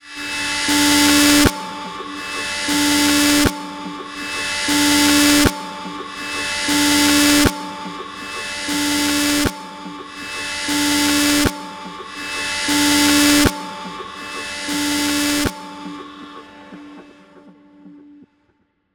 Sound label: Frozen Alarm